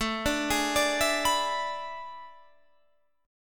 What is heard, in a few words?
A Major 7th Suspended 2nd Suspended 4th, Guitar, Half Step Down